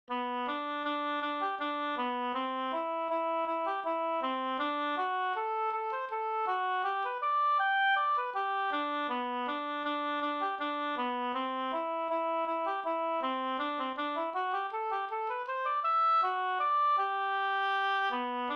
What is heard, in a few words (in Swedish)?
vals